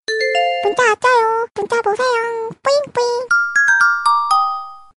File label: korean sms notification